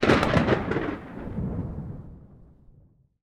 Lightning Strike

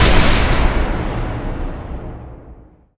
Gale Lightning Strike; Heavenly

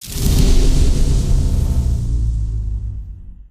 Weapon Enchant

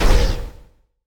Brimstone Fireblast Impact

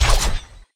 Knife Throw Full

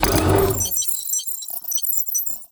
Advanced Display Install

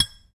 Plating Mine

